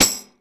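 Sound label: SN+TAMB, snare tambourine; CONSTRUCT 2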